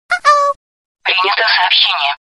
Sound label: ICQ sms